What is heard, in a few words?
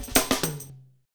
Hip Hop, Drumscapes Roland; Northstar